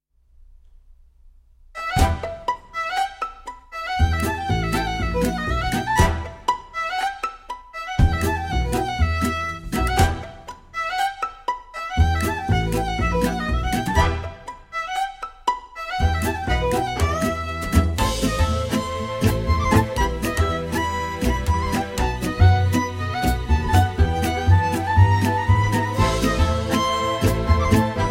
Swingin Fiddle, bluegrass fiddle; Red Room Audio; Traveler Series; Christopher Siu; kontakt

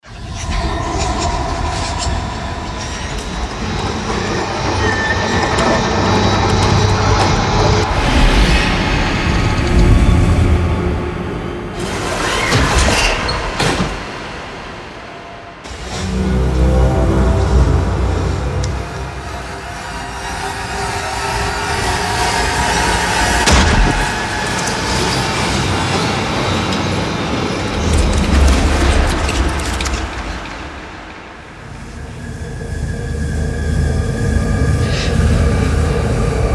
Cinematic Dark FX; Function Loops

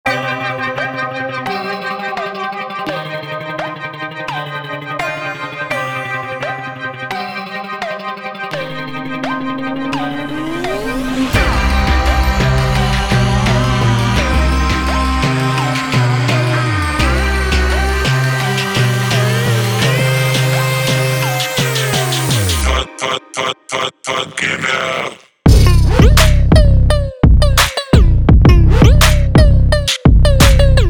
Serum; Splice Sounds; KARRA

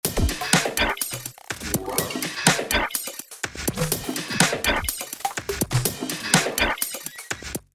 Trashcan, drum loops for organic house; Basic Wavez; Dulus

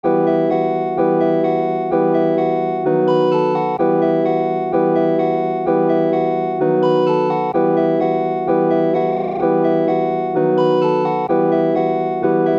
128 BPM; FORTHENIGHT; Phases; SpaceX

